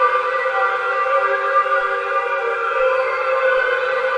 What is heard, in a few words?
guitar e bowed loop